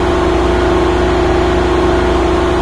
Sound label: ambience; svencoop